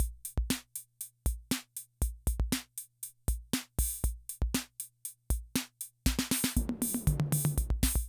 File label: Loop, rhythm 55, classic machines